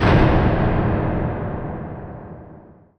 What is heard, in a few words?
sfx sci fi; Masterbits - Switch FX